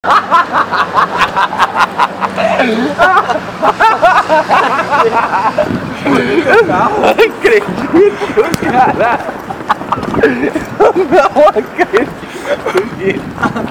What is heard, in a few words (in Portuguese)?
risada